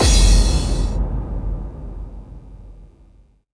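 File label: bass drum FX, dance drums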